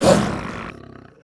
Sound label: death